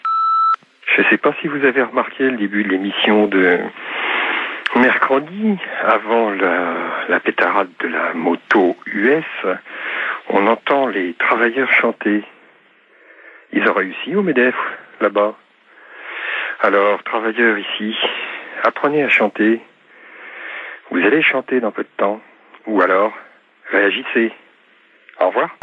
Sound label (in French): Chants d ouvriers, repondeur